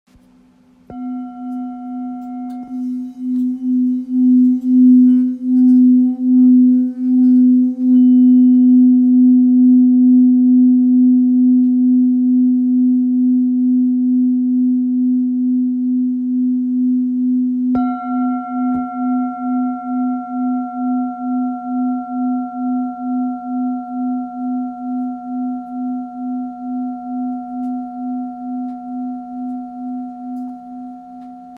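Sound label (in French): Bol ancien fa, bols chantants tibétains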